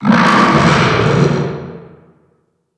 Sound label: alien